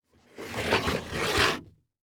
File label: Foley Footstep Golf Cleats Slow Scrape On Solid Wood Platform; Foley Footsteps